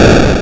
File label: 8bit explosion; gamerip; blastmorph pinball